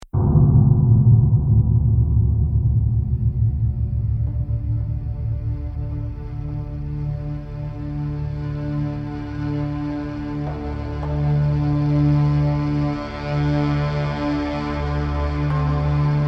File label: mood; inFamous; PS3